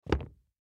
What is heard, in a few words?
Tennis Shoe Down, Tennis Shoe Set Down; Sports; Blastwave; Foley